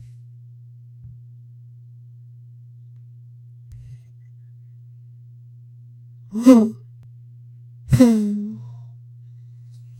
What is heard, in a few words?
facepalm thump plus frust; annoyance